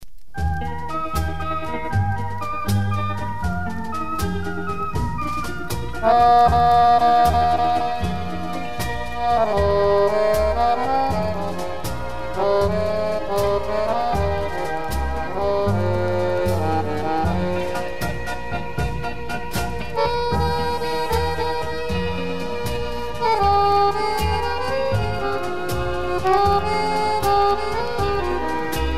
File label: slow orchestra